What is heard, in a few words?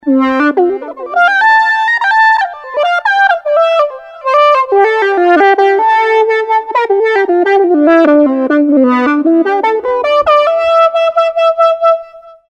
Cry My Bassoon